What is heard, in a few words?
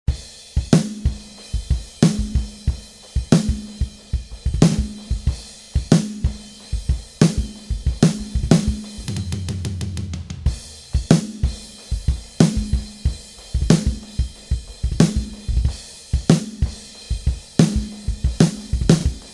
drums raw